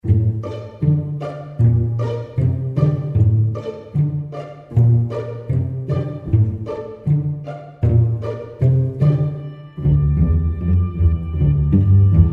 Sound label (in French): AMBIANCE CORDES PINCEES